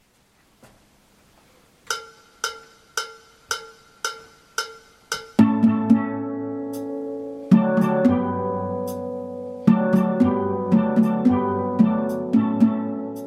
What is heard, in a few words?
Guitar Intro